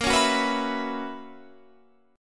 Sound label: B Major 7th Suspended 2nd Suspended 4th A sharp, chord shape